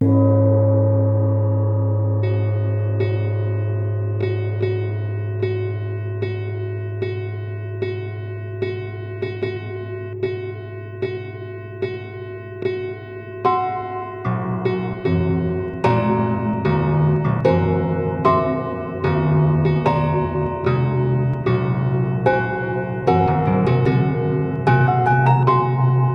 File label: Temple Bell Sketch, hyper Octave